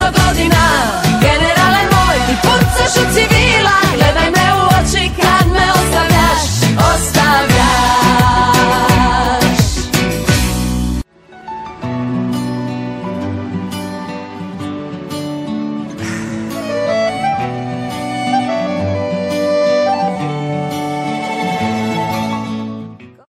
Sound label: cool song